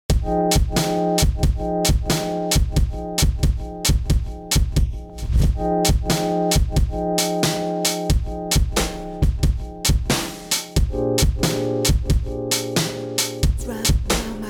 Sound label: bpm 180